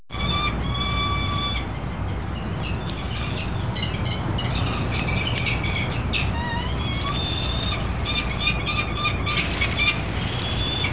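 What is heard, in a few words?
peregrine falcon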